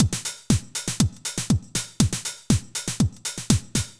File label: House Drum Reverb